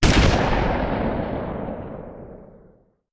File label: Rifle; Sound Ideas; Guns